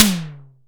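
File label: SDS V SNR, snare; Best Service; Real Mega Drums VOL-1; MONO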